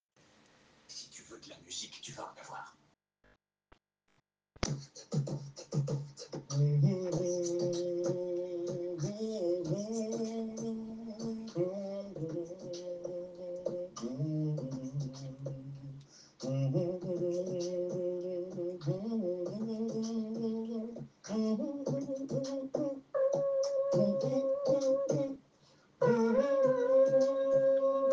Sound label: Amazing Beatbox